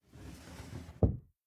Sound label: Hiding Spot Enter Door; The Inn